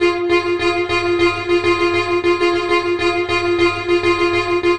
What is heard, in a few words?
Strings